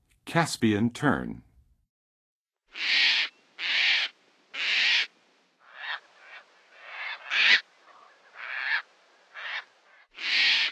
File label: Caspian Tern, Birds of North America; Western USA; Western USA Songbirds